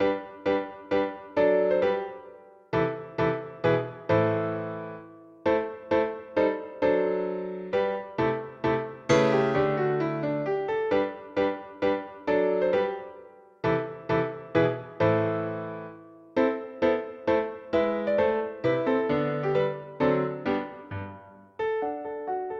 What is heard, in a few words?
menuet; duvernoy jean baptiste; menuet mignon